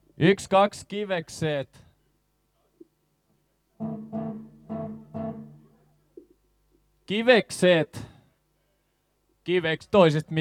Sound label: soundcheck